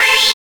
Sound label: synth; osu